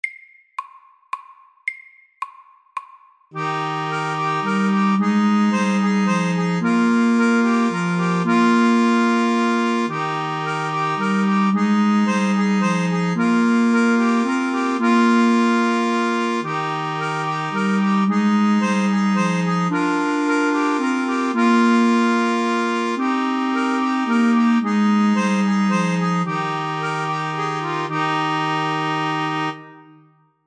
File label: Musette FAST 110